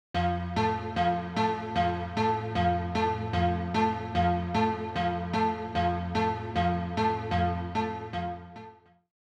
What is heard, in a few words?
splash audio; Sea of Lies Beneath the Surface Collector's Edition